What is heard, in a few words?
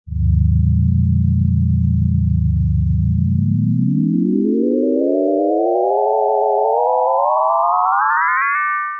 self osc, poly